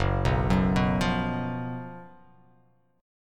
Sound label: F sharp Major 7th Suspended 4th